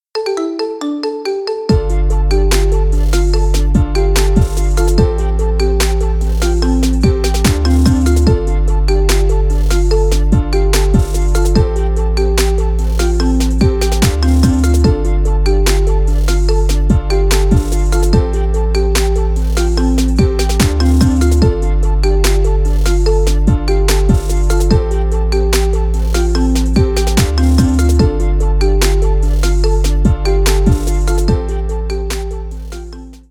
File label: marimba remix